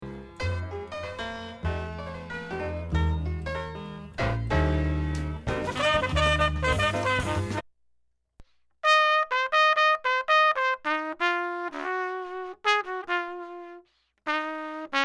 ear training with recordings

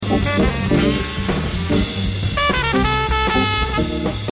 shared motif

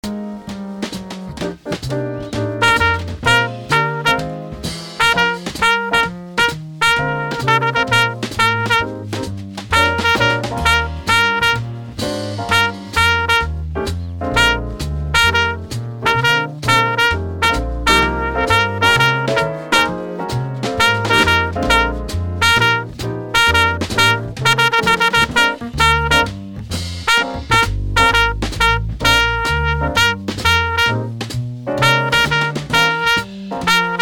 rhythm

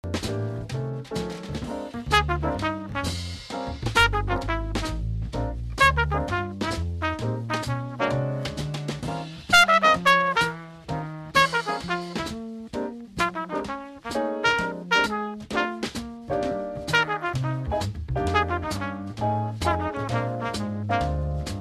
motif

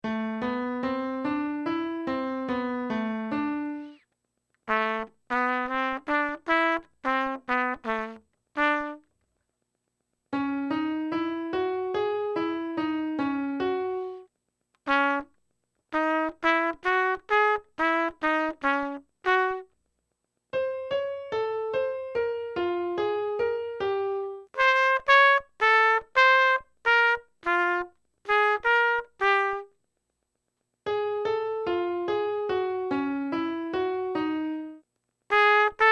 ear training example jazz licks

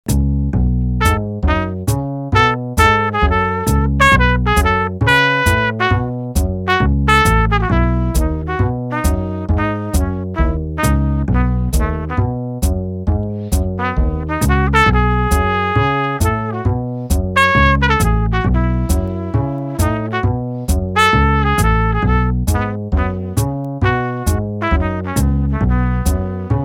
c sharp dominant

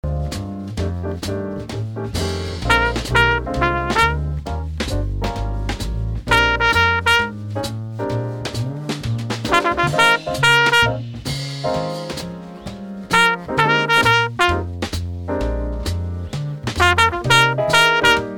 rhythm

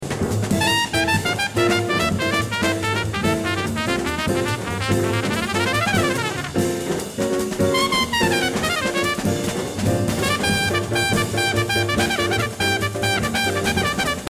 comping

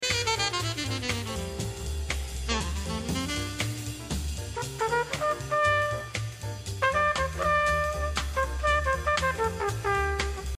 shared motif

